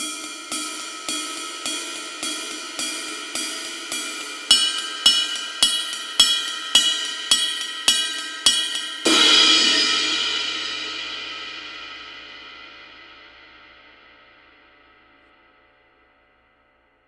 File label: SPECIAL EDITION Ride 21